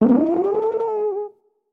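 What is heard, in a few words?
sounds of tuba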